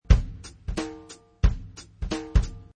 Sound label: Jazz drum loop at 180 bpm.; Drum Loops